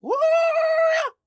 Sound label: Attack; Gorb